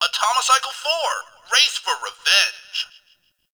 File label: Game Bark; Atomicycle; Warframe; Ambience; Nineteen Ninety Nine